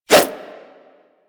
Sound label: Nunchuck Whoosh B, Infested, Weapons; Warframe